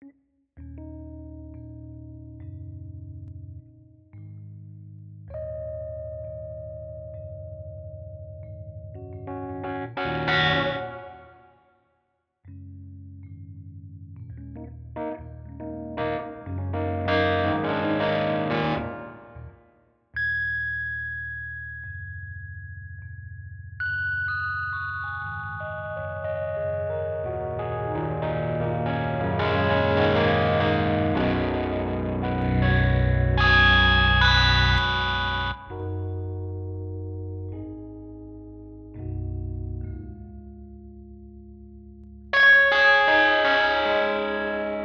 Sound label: sea feedback